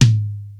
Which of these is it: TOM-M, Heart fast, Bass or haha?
TOM-M